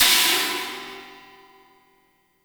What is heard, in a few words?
CHINA, HI-HATS; Spectrasonics; Burning Grooves